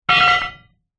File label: industrial manhole cover close; zapsplat